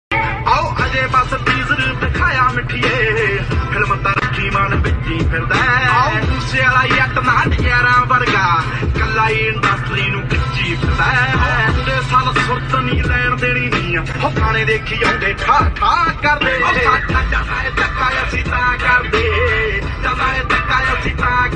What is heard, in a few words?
Dance Punjabi Ringtone